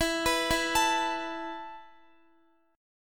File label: E Suspended 4th E, down, Guitar, 4E,4A,5D,5G,5C,6F; Esus4; arpeggiated